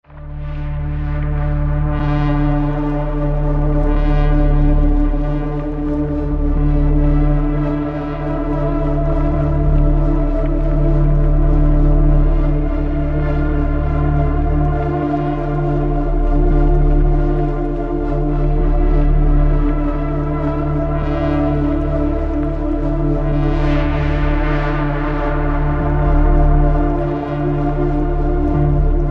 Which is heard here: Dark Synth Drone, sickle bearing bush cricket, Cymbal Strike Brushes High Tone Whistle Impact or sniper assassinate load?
Dark Synth Drone